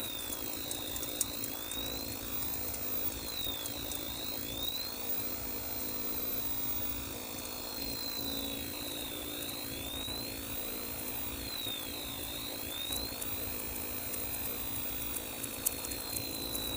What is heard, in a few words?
amb ext telescreen; Titanfall 2